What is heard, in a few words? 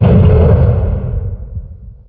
ambient roof top war; hl2